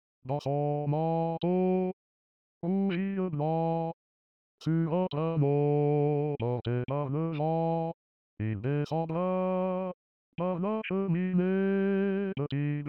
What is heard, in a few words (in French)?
hommes